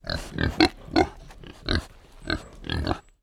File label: pigs kunekune x2 grunt snort